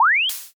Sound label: warp out